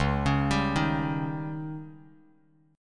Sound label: Db Suspended 2nd Flat 5th, Bass Standard; arpeggiated